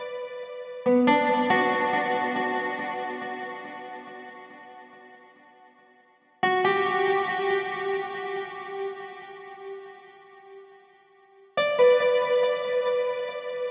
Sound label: Guitar Intro 140BPM, Eminor; Melody & Synth; Vengeance Samples; Incast Kit